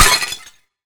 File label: crash